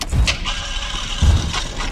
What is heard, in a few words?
gear grind